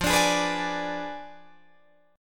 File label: F Diminished 7th F down Guitar Open D